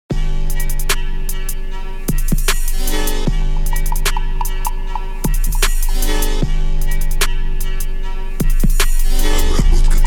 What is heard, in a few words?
23-26 Hz Low Bass